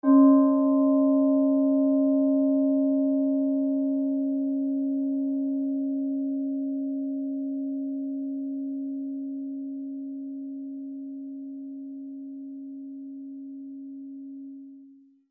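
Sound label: usa cristal bowl set, C sharp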